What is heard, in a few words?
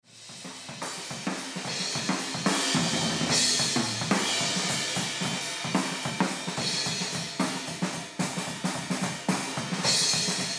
Drums Kit Mic Room FX On